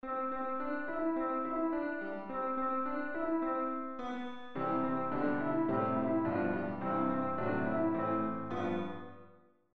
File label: Yankee Doodle Melody Harmony